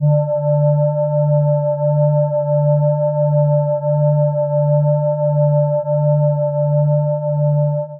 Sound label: Solfeggio Mirror Gongs; Instruments; Ableton; Drone Lab; Xtonal